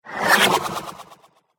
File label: Cartoon Magic Spell